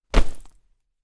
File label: Cloth Character Movement Foley Whoosh; Miscellaneous Human Sound Effects; LynneMusic